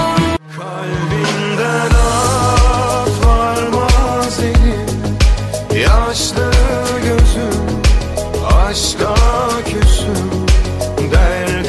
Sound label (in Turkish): roman turk zil sesi